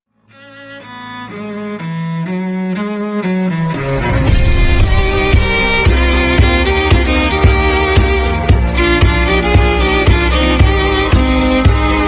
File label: instrumental violin cover